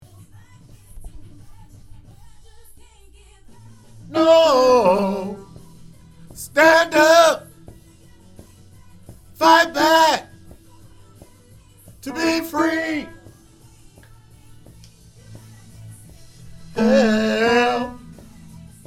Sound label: Tenor